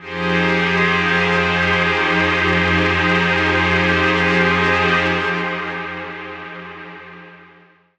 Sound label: pad thin F